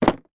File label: foley small card box drop on hard surface